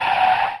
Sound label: Aircrafts Landing End; DCS